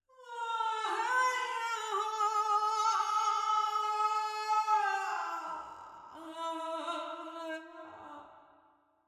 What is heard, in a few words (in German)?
Hier habe ich beide Raummikrofone schnell und chaotisch durch den Raum flitzen lassen; Sängerin; Eventide Tverb